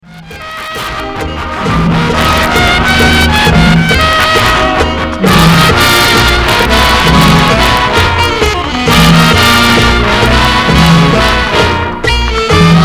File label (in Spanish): Trumpe en Merengue